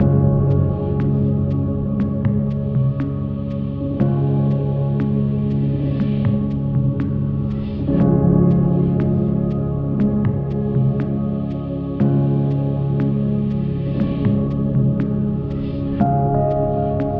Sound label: Piano House; minecraft story mode gamerip 2016; Order of the Stone